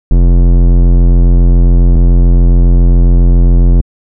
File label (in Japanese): 短形波サブベース